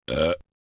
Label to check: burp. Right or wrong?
Right